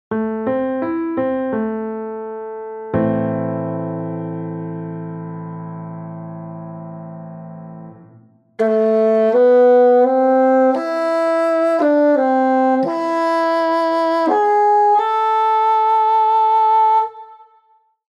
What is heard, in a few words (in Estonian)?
Fagott; a-moll